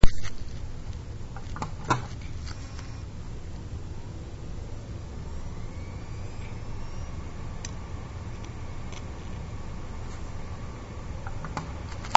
seagate barracuda 7200, hdd

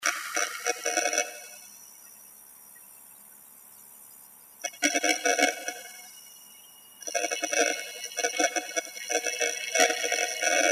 7200.7 activity, hdd, noise; seagate barracuda 400GB

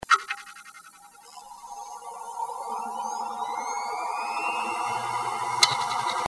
startup, hdd noise

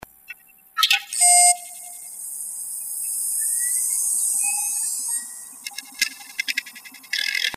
startup, hdd noise; dmaxplus9

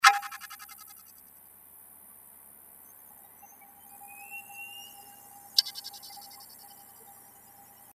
startup, hdd; sp1614c

